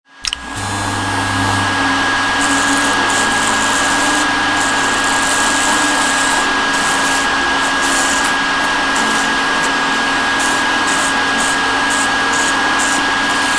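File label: Sounds, Hair dryer mixer razor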